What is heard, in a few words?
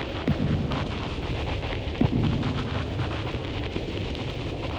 Cracklature, sparse soundscape